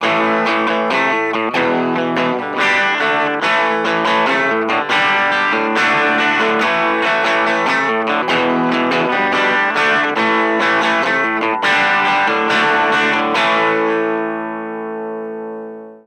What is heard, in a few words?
overdrive low